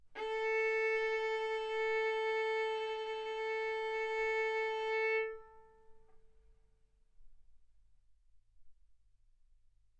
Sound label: Violin arco mf sul A A4 B4 stereo